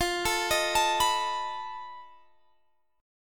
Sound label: F 7th Flat 5th F down; F7b5; arpeggiated